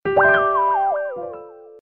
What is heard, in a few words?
Defeat; CrazyZoo